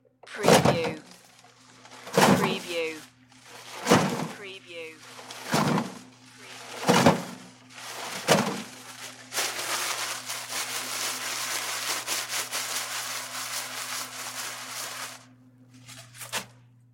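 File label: Paper Towel Rip Rustle Throw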